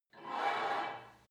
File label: take off weight barbell reverby quick, fitnessstudio